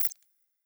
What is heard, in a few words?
Ui Menu research item click